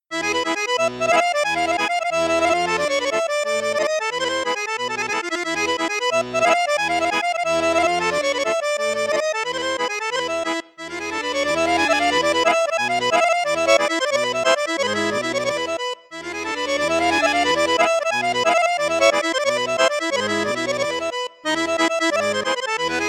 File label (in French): registre basson flute juste piccolo, accordeon; cavagnolo vedette 10; traditionnel